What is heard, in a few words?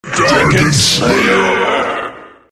jak death; Jakiro